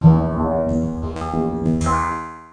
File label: resonant travel note, Synth